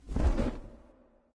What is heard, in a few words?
wingflap; smite; thanatos